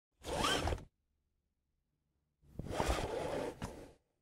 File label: car belt; بستن; کمربند ماشین